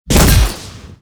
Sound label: sparks wild expand; netent